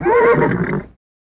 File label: Horse Whinny Neigh Ni